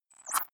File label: click domino effect, signale